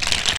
crumple, Foley; Crowdsourced Sample Pack; TRUTH-HZ

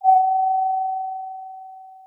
Sad whistle one shot, synths; Crowdsourced Sample Pack; TRUTH-HZ

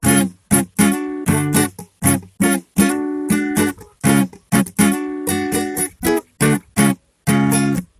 Ac Guitar Loop 110bpm; Crowdsourced Sample Pack; TRUTH-HZ

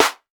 Roland SPD30 Snareclap; Crowdsourced Sample Pack; Drum Kit; Claps & Snares

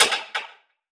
Shuffle Perc; Crowdsourced Sample Pack; TRUTH-HZ; Drum Kit; Percussion

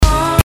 uuuh, Hits; Crowdsourced Sample Pack; TRUTH-HZ

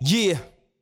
Vocals; TRUTH-HZ; Crowdsourced Sample Pack

